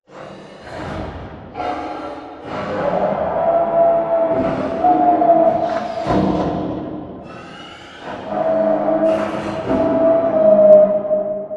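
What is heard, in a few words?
Assembly Wall Arm Distant; Boomtown; Titanfall 2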